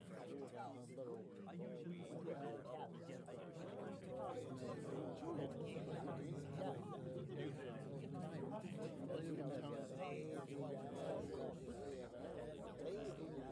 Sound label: Audience Loop